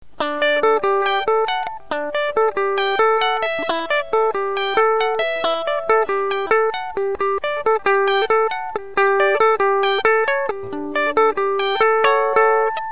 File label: RIFF